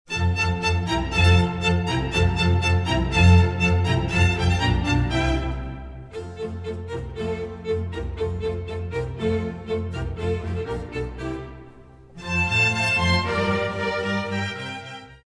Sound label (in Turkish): santral fon muzikleri